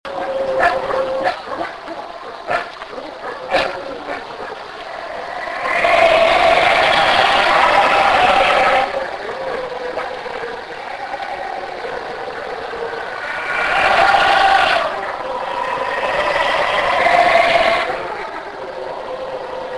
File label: howler monkey